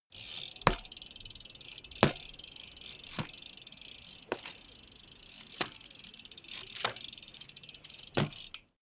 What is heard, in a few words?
bmx maneuver free lift tire